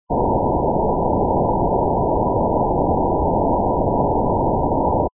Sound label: noise LF